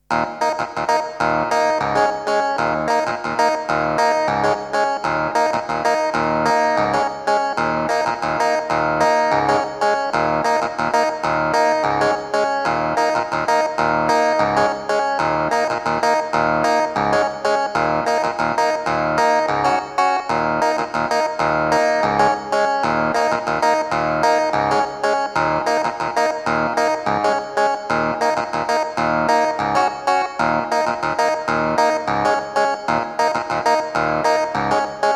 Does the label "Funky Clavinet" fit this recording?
Yes